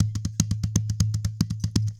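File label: 120 DEEP DR, drum; Best Service Advanced Media Trax Modern Composer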